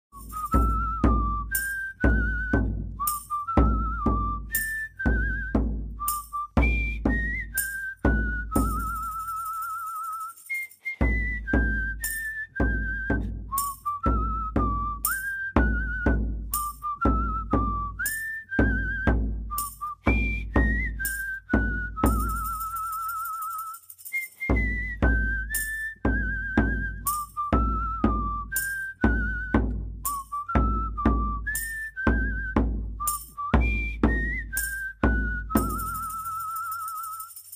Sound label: Happy Birthday Drum